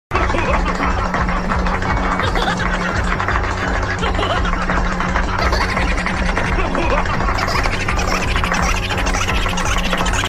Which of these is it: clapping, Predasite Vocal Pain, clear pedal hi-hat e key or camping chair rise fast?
clapping